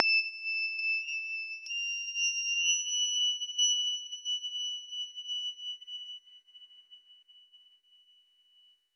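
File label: bright but soft, glass harp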